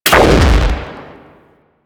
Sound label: Sapient Pistol Explosive Impact; Warframe